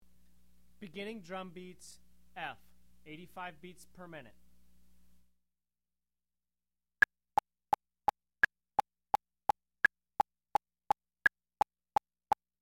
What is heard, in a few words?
beginner drum beats; Drum Lessons